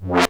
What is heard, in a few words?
Synth Sound; Vengeance Samples; VEE Synth Shots